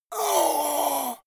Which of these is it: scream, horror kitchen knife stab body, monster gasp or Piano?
monster gasp